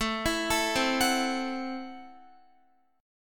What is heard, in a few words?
A Minor 6th A Guitar; x,12,14,14,12,13; arpeggiated; Am6